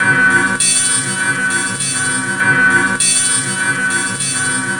Skip Guitar, Strings; Sonic Foundry (Sony Creative Software); Myths of Technology

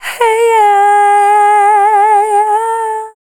Dance Vocals, FEMALE

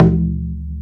Taiko Long Stick; Planet Earth; Digital Sound Factory Planet Earth

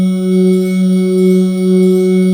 SYN Neon Pad; Best Service Gigapack 1

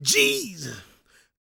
gospel male, vocal; ILIO; Vocal Planet

